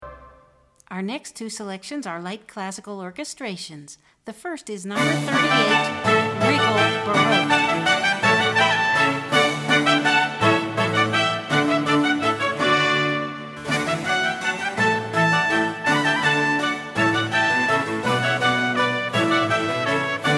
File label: Baroque